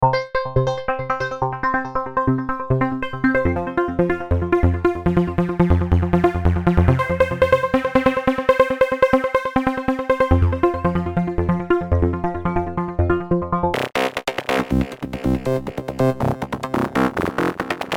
Morphox Arpeggios

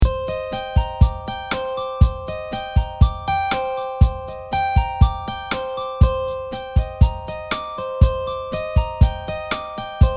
CLA 76 Attack Release; Waves

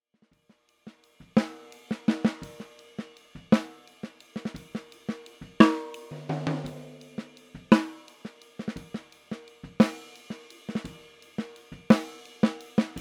Snare Drum; Extinct Audio Black Ops

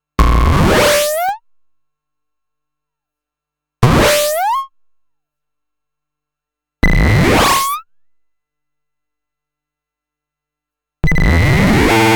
Reon RE501-R Driftbox-R Arcade FX

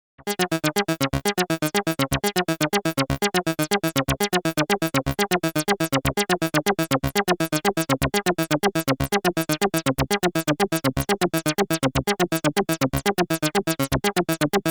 Filter Modulation

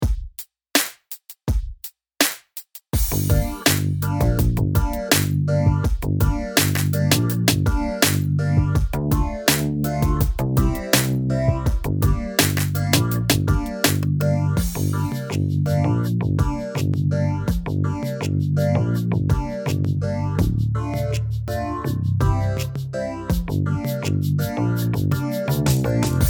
hip hop beat machine

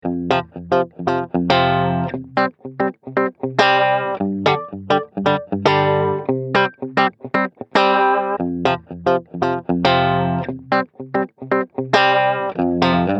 Git original

